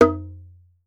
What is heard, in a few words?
EARTH TN, EARTH DRUMS; Voice Crystal - Steve Reid Definitive Percussion